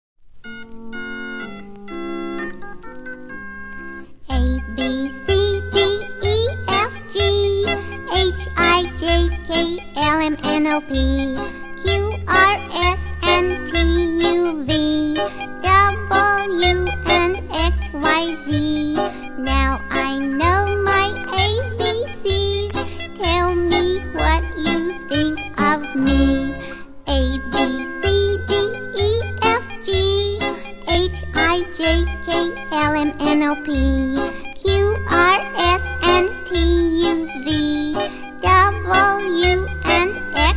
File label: Alphabet Song